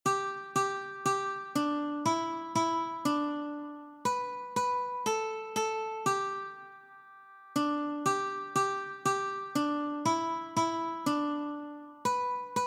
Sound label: Old MacDonald had a farm Ukulele